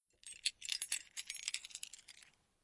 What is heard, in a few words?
key pick